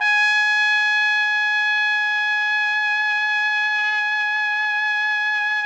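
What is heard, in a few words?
trumpet; Roland - LA Composer vol. 3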